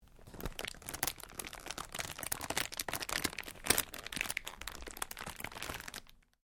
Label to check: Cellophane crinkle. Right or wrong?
Right